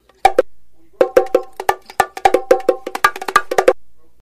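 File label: bongo melody fast long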